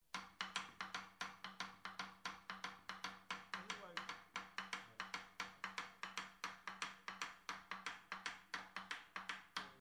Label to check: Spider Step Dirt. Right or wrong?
Wrong